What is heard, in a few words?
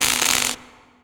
elec spark welding; Call of Duty Modern Warfare